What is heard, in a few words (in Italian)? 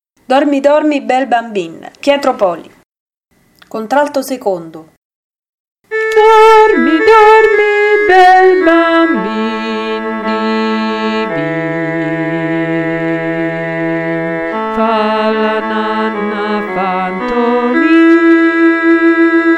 dormi dormi contralto